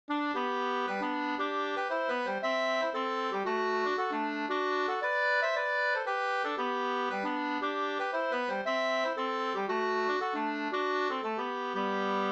polska Tierpspolskan

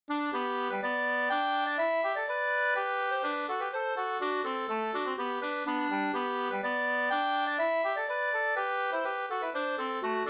hamburska

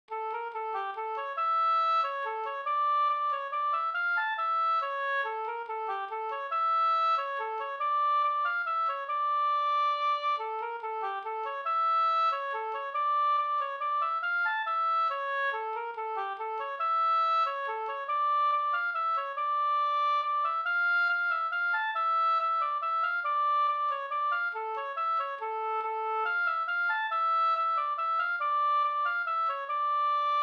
vals, D moll

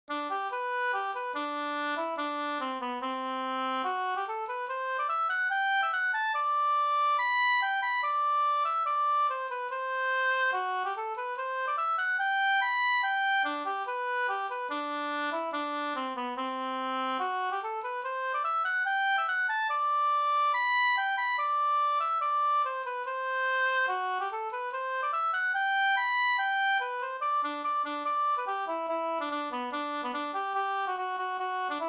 schottis 4-4

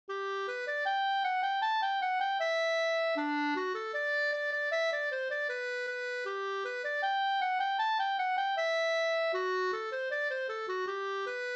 schottis

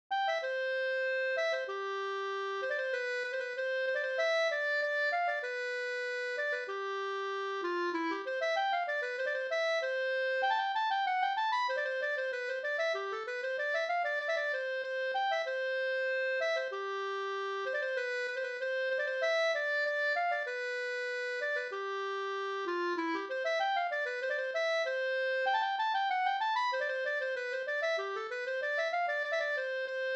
skanklat

